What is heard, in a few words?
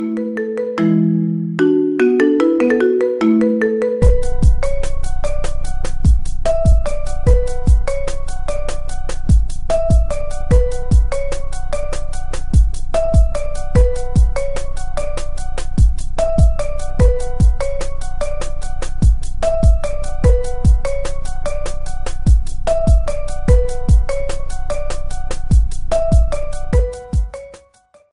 marimba remix